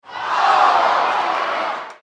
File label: Crowd Arena Big Oh; Crowds (Sound Effects:Backgrounds); SPORTS; SoundEffects-Blastwave